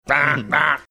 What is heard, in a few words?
singing two notes